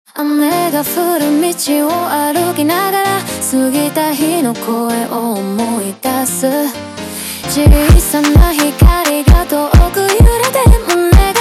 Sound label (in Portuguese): Montagem